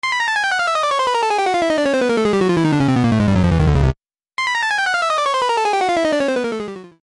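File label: awesome 80s arcade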